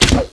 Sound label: Arrow Fire